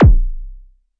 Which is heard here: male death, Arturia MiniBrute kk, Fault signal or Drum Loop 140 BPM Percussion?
Arturia MiniBrute kk